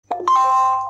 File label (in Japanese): Xperia通知音; Alya